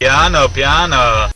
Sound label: piano